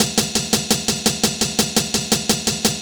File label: Jungle Hat, Hi-Hat Loops; KJ Sawka - Drum n Bass & Beyond; Drum Tool Box